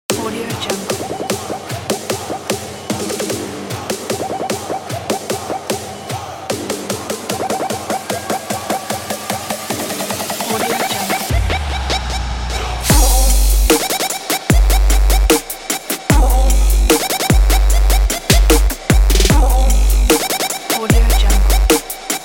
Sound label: trap